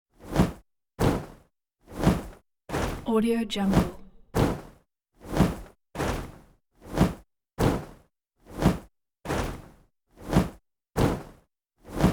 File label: giant wing flaps constant flying slow